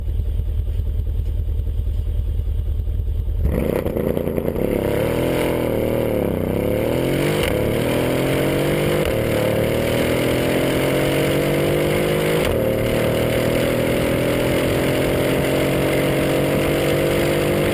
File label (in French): Harmoniques